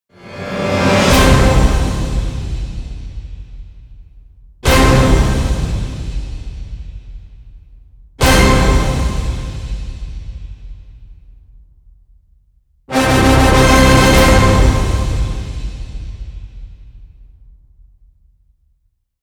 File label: Orchestral End Hits (C); Epic Tools vol. 1